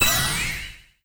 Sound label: Card Get